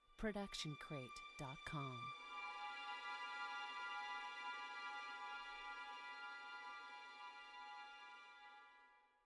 mysterious strings; soundscrate

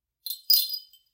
Short Wind Chimes; SoundsCrate

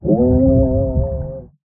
underwater scream male long; soundscrate

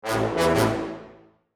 playful low brass

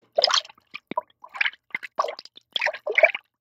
water splash

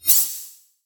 anime sword swipe up; SoundsCrate

